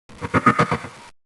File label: Storm Elemental - Defend Sound; Heroes3